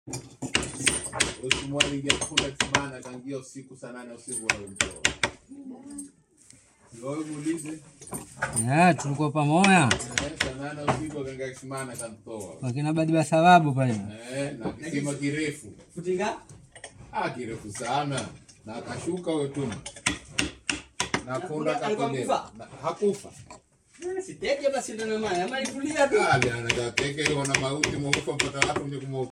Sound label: carving; mahazi project